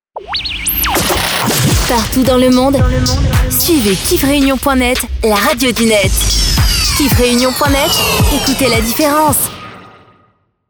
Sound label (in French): Jingle; Kif Reunion